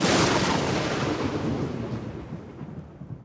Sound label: thunder, Ambient; NASCAR Thunder 2004